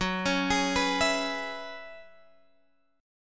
Gb 7th Suspended 2nd Suspended 4th, Guitar, Open G